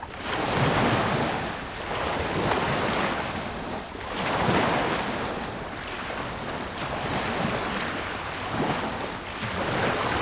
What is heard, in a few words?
Lake Shore; Blastwave FX